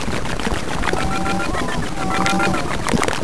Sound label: gurgl